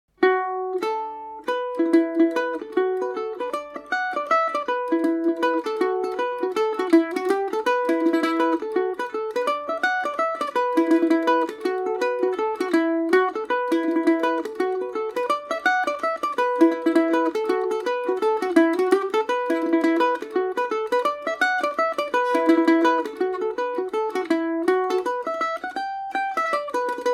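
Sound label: E Dorian, Irish mandolin; green fields of rossbeigh; The Green Fields Of Rossbeigh; Paris Swing Macaferri mandolin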